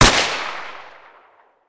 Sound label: Deagle SD, Guns Fire; Weapons